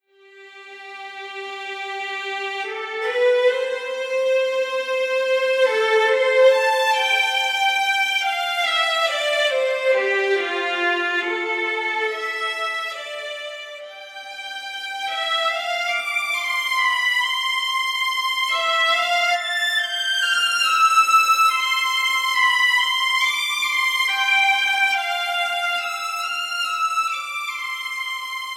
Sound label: Strings